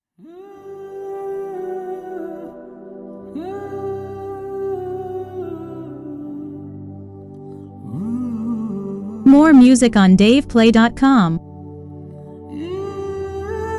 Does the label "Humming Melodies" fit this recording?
Yes